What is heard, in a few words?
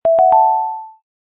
collectible; croc runner